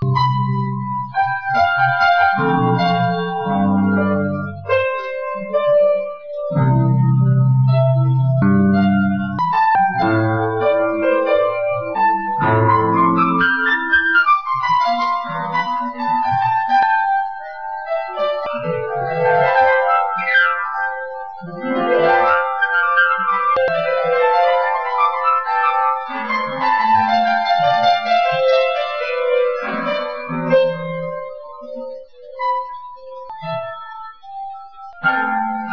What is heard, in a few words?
piano